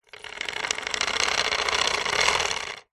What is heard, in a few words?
industrial chain small pull over thin wood hoist medieval medium slow